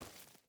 footstep burlap dirt walk; Rust

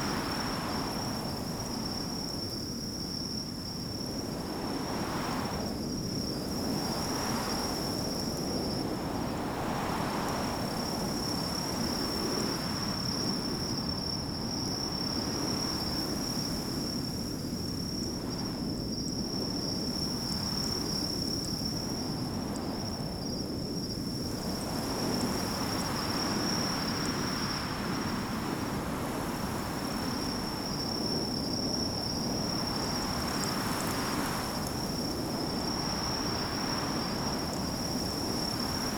ambience coniferous forest night; Rust

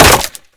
impact wood; Modern Warfare

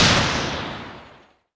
gatling cooldown; Modern Warfare; minigun

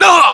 death; english

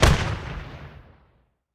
apache bullet hit layer; War Thunder

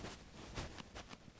Move Sack; Civ4 warlords

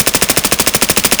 vss loop indoor close; Escape from Tarkov

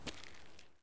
walk concrete; Escape from Tarkov

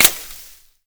impact foliage; weapons; Modern Warfare

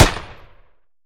automatic AK-74 end, weapons; DCS

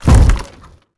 impact log medium

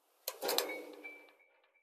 ambient port; World Of Warships; port3d

